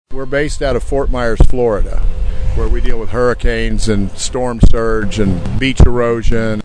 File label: buzz; waid trap bag inventor